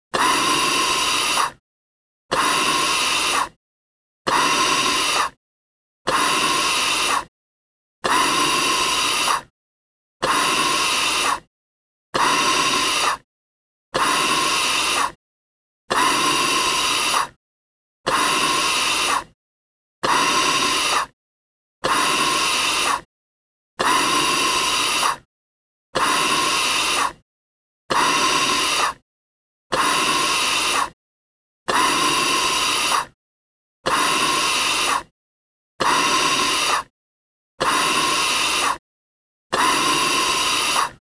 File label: hospital respirator; Asian Show Sound Effects